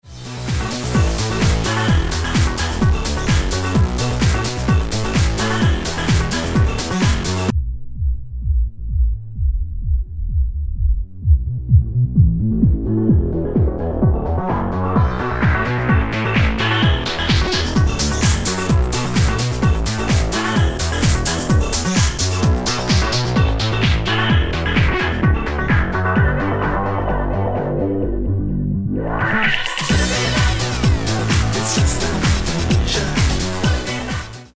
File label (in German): Xone 92 LPF mit Reso